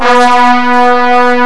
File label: trumpet; Tank